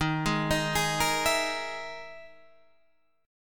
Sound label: E Major Sharp 11th D sharp